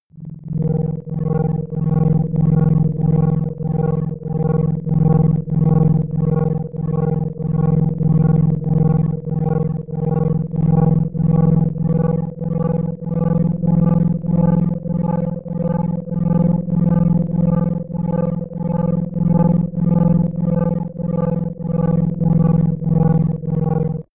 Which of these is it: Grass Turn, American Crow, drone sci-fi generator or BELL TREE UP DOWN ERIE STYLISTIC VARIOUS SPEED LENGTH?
drone sci-fi generator